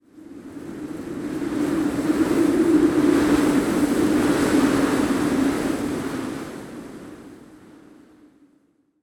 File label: wind; Level2 Ice Fields